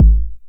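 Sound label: 909 BD tape, kick drum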